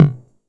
Tom Delay, TR-606 Bent Samples; Sonic Foundry (Sony Creative Software); The Electronic Music Manuscript Collection; Battery 3 Sample Kits

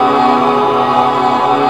G#4 VOX; Northstar - The Wizard VOL-1; VOXTAGON

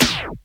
CUT SD; 90 sSampleCDs; Crypton - DrumBase5000; GARAGE STACK

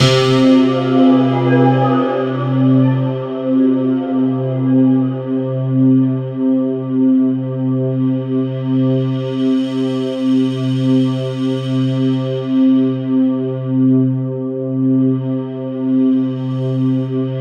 C4, Synth Line; 90 s Sample CDs; NiceBeats; SynthLine VOL-11

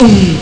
fat bass drum; Amiga Soundtracker Sample Packs

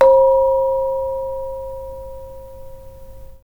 KONGWONGLEK C5; Spectrasonics; Heart of Asia

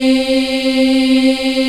C3 child, Choir; CHILD IHF

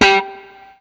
BLU POP, Guitar & Bass

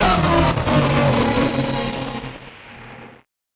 Malfo Syn, malfunctioning synth

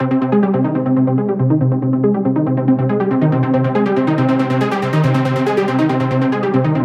140BPM C; Vengeance - Essential Clubsounds Vol.4

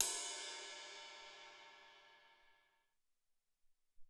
Rid PP, Alesis SR-18

